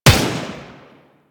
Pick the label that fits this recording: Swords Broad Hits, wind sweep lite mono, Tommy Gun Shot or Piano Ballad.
Tommy Gun Shot